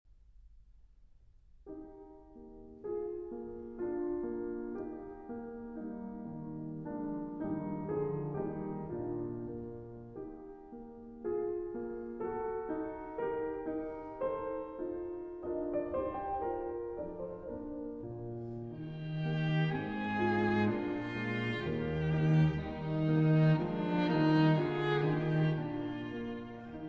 Piano Trio No. 3 in C Minor II. Andante Cantabile Con 5 Variazioni